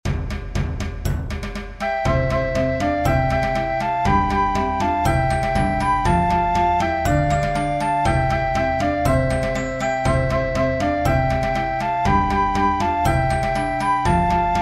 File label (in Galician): Conxunto Instrumental